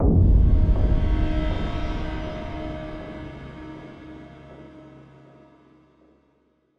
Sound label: Stingers sting pain